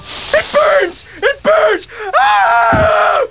burn; dod

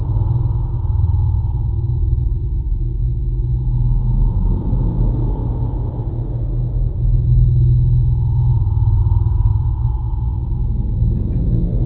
haunted; cstrike

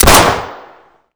gun, weapons; svencoop

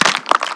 die, snapbug; svencoop; scmod; npcs

